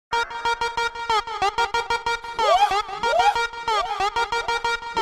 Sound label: Musical SMS